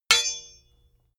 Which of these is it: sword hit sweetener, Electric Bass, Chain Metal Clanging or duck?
sword hit sweetener